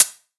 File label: Ride; osu